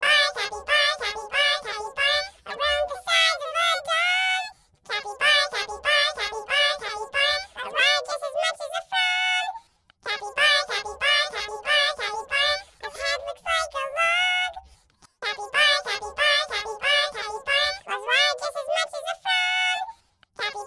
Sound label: Vocal Only; Capybara Stage 3